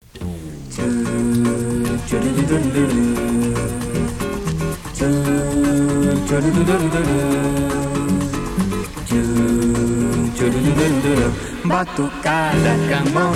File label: Batucada, Jazz Samba